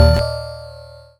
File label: Digital Mallet C1